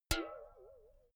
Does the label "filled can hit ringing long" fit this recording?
Yes